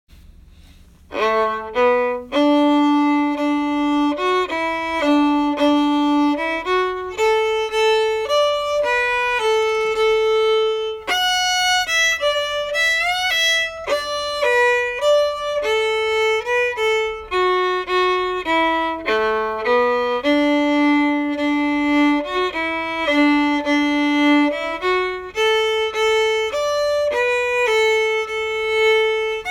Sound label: march slow